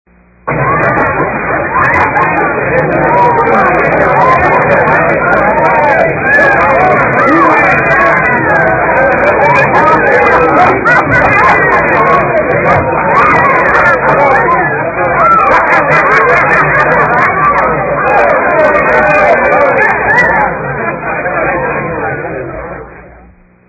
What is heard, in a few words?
Party Scene; At the sound of the beep